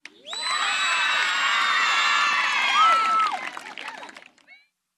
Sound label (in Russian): ochen radostnyj krik i apl